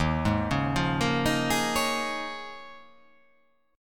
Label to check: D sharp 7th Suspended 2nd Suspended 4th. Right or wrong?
Right